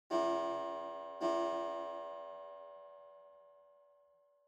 Pendulum Clock Chime Far Mid

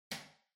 Light Switch-Rocker (Far-Hall-Hard)

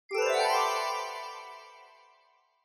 Scene Change Low Up Fast

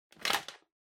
Vacuum Cleaner Head Remove

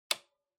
Calculator Switch

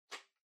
Power Strip Far Hand Gentle Unplug

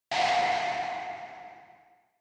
Single Accent Low Short

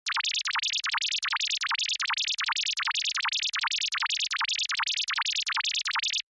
Onoma Panic High

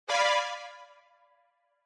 Parody Accent Mid

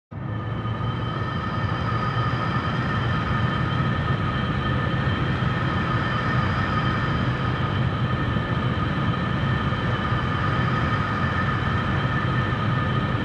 Retro Anime Jet High Close

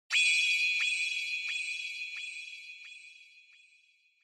Onoma Flash Low Delay; オノマトペ 光る; 低 ディレイ

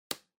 Air Circulator Switch

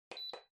Electric Fan Far Switch

